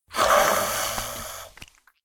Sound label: Ghoul Growl; SyrinScape; East Ward